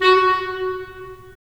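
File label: wind clarinet; Roland - LA Composer vol. 3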